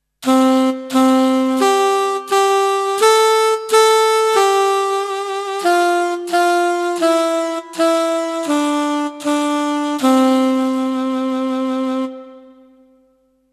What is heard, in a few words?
saxophone